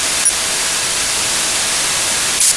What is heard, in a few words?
single burst; STRELA 388